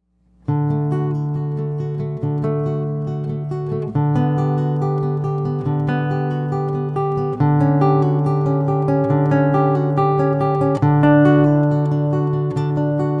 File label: prelude from cello suite 1; J.S. Bach